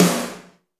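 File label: snare drum, Real Mega Drums; Best Service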